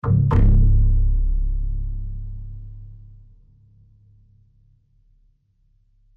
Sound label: Stinger for Pop Up; Bungie Stinger for Pop Up